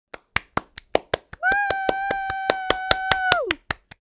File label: Cheering boy child kid